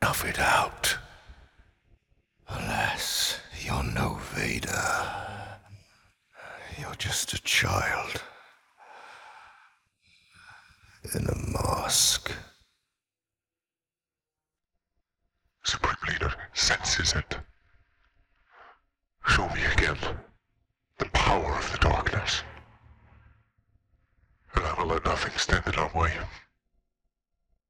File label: vocals; htdemucs 6s